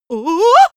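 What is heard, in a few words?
female scared comic, screams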